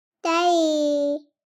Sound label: BABIES TALK Boy 3 months Daddy; Baby Boom